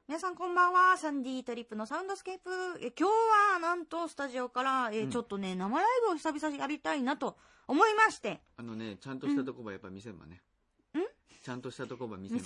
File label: SoundScape